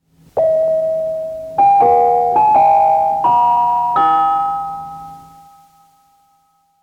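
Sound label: Radio Sweden Interval Signal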